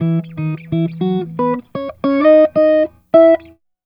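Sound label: Guitar E major, Jazz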